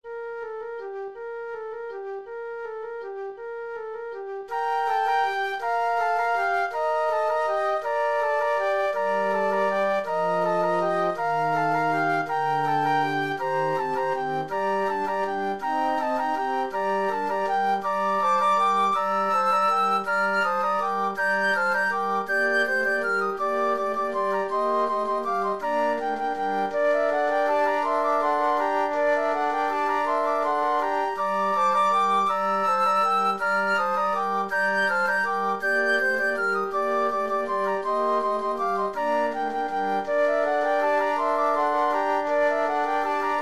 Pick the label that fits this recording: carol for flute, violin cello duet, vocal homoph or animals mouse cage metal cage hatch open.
carol for flute